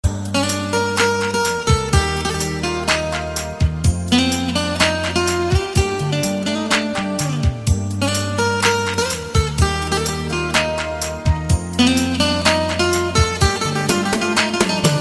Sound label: Guitar music